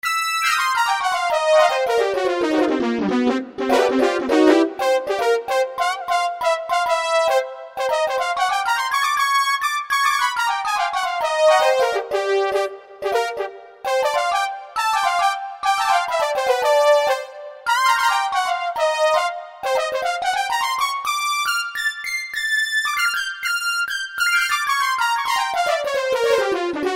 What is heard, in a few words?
Chiff Brass Lead